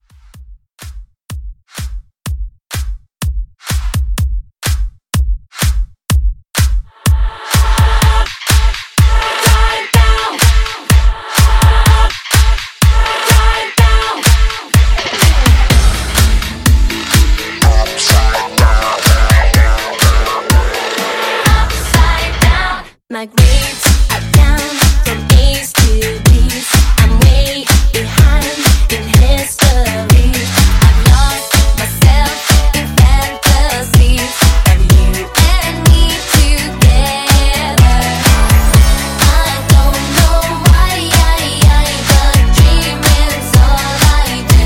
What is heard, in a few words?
Pop Redrum Intro Outro